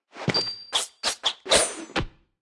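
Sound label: Classic Goblin, 动作音效; 哥布林; 绿野世界; 部落冲突; 皇室战争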